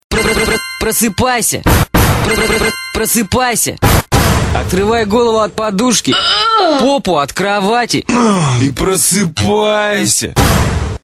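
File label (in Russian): музыка на будильник телефона - будильник - просыпайся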